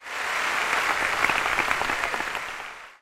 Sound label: applause extra; Cartoon Network - Toon Cup 2020